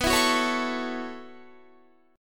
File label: B 9th Suspended 4th B down Guitar; strummed